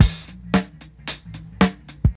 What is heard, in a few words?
75 bpm fresh breakbeat A key, kick tuned to the A note; drum loop